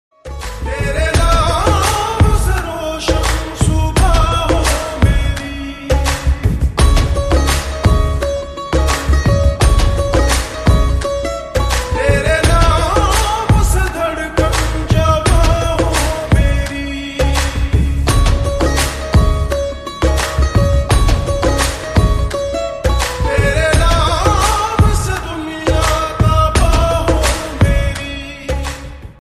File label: Slowed Reverb Ringtone